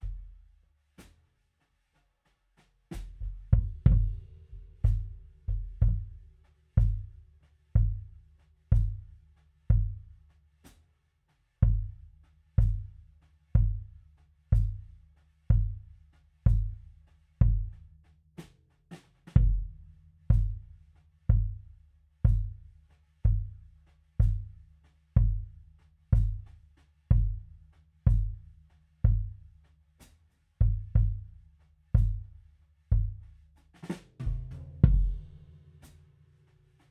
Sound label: Kick; TubeTech; CL-1B